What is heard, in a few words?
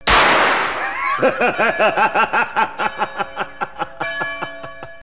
death; singe